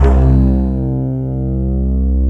FLUTISH BRASS; Greytsounds; Scott Peers Sound Engineering

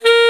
Alto Sax A#3; Proteus 2000; Digital Sound Factory Proteus 2000

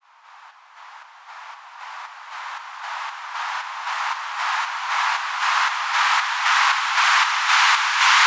Pumping Riser; Electronisounds

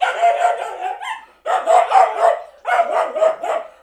DOG; AKAI S-Series CD-ROM Sound Library VOL-6

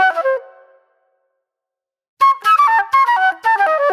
Movie Flute

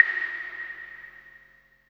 CLAVE; East West - Phat & Phunky

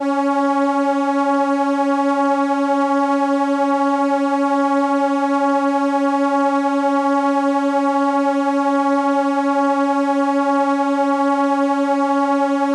Viola C#5, ARP Solina

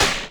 Yamaha RX-120 FM Perc

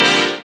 synth house, dance synth; Sound & Vision - Gigapack I CD 2 (Roland)

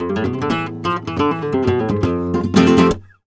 Flamenco Guitar Frase

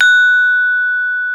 BRONZE PEK, Bronze Gamelan

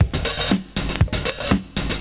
CYMB, Breakbeats; USB Soundscan vol.46; AKAI

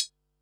HH, Pearl SC-40 Hihats and cymbals

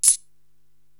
Shaker Scrape, Drum Machines, Korg TR-Rack

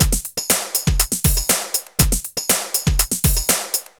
drum loops

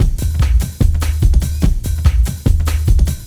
JUNGLE; 143-170 BPM; Spectrasonic Distorted Reality 1

